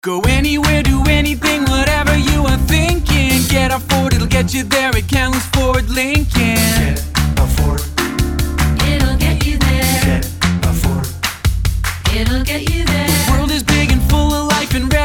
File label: Jingle; Kamloops Ford Lincoln